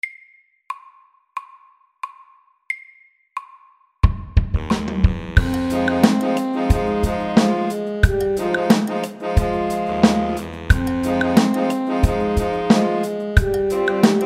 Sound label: Funky drums MEDIUM 90